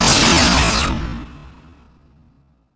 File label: laser; ImpGal2; groundwar